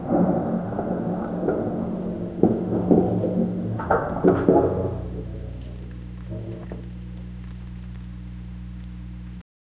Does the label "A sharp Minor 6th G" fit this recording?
No